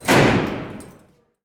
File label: DOOR HIT; DXM EarShot